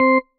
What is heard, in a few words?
marimba tissue; ゆめ2っき